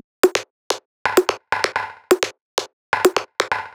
perc 128, Electro percussion loops; Anthemic

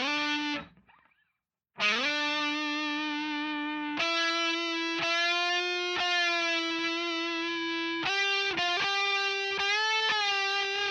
lead guitar

